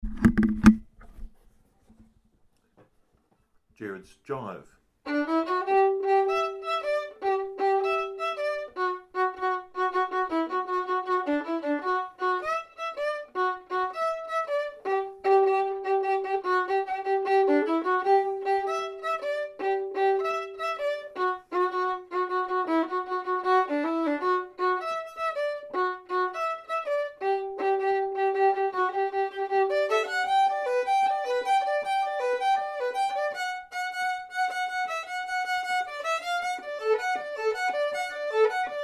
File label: solo violin rough recording